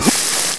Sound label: pl burn pain, player